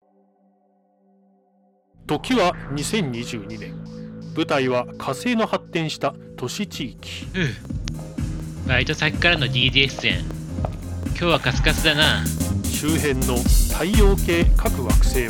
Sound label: synthwave